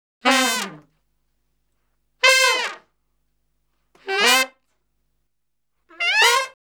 Salsa Picante; Masterbits; World Zone VOL-3

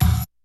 KICK SNARE; Kid Nepro; Elite; KICKS SNARES

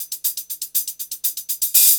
120 HIHAT

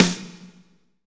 SNR, Snares, Roland

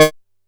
D#4, Bass; E-MU Producer Series Vol. 6 – Analogia Project; Boom da Bass

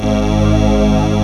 LINEAR SYNTH

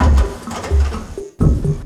loop 127bpm, Hip-Hop Street Beats; Street Beats by Poogie Bell

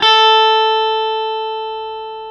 Classic Guitars; E-MU Formula 4000 Series Vol. 3 – Analog Odyssey

